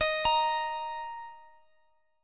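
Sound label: E flat 5th E flat, down, Guitar; arpeggiated; x,x,x,x,19,21; 4A,4E,5A,5D,5Gsharp,6Csharp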